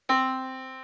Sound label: yamaha Disk